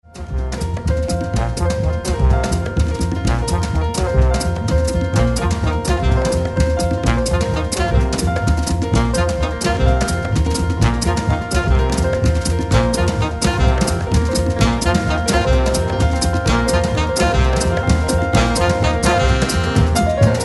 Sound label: Samba; Roda De Samba